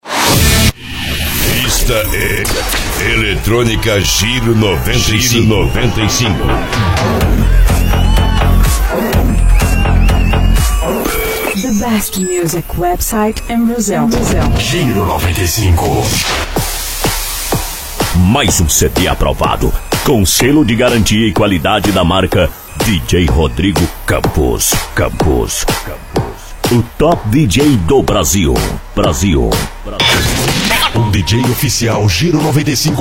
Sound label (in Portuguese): Pista Eletronica